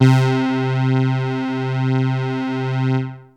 Techno leads, hits and riffs

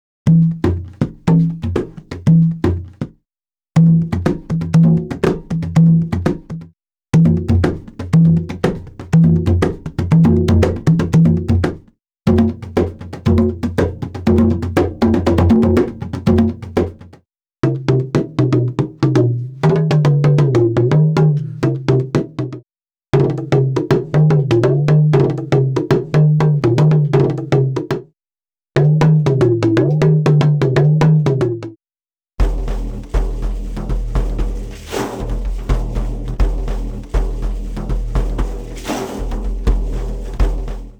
Didgeridoo And Overtone; Good Stuff Audio

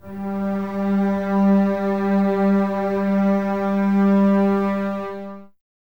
Basses G 3, String Ensembles; Miroslav Vitous; 90 s Sample CDs

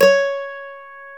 MANDOLIN

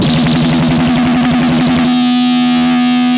ZAP GUN, Funk Guitar; 90 s Sample CDs; Zero G

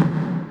Tom, Drum Percussion Single Hits; Zero-G; Nu Disco

